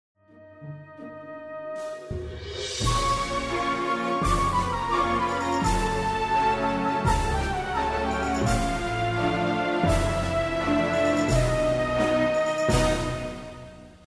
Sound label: lost round; music kits custom